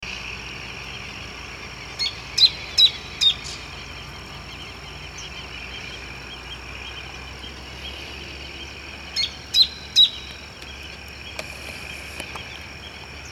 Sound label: Phacellodomus striaticollis; Silveira